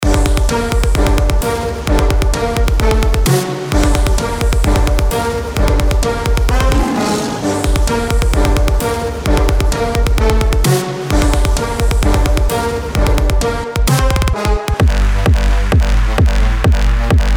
הסדרה האינסטרומנטלית; קפיצה

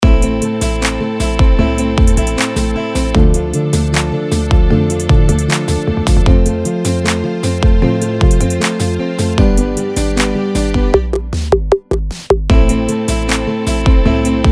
ביט

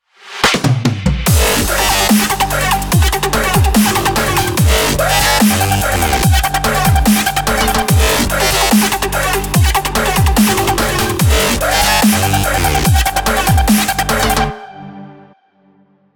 דאבסטאפ חמוד

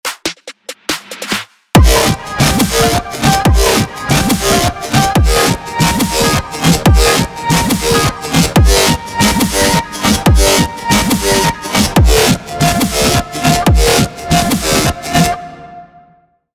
דאבסטאפ

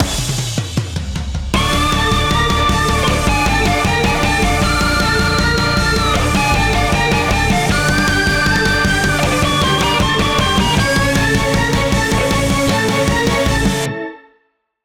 רוק חסידי כניסת חתן

